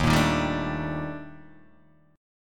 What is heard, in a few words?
Eb Major Sharp 11th Eb Guitar Open D